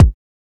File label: old school short reverb kickdrum g sharp key